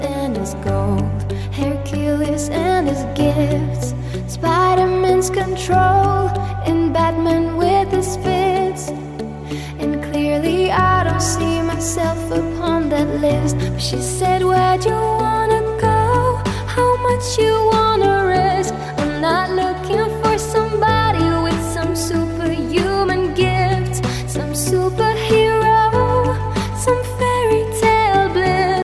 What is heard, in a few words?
new remix ringtone